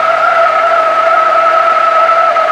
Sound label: Skid Tarmac; Totaled; XBOX; skids